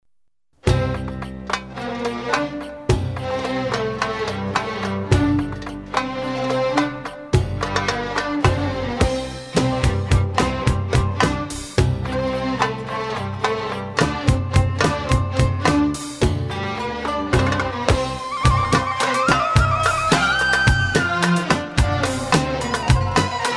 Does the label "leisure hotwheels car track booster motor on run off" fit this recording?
No